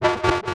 Science Fiction Sci-Fi Electronic Pulsing Tone

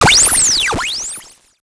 Multimedia Internet CD-Rom Flash Radio Element

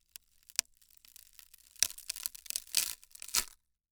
Crash Wood Crackling; Crashes

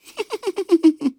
Cartoon Human Male Giggle

